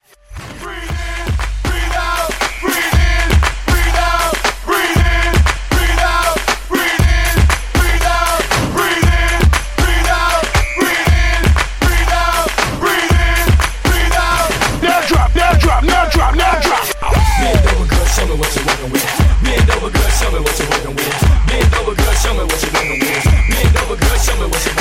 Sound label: Break, 118